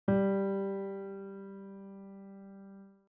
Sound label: bass note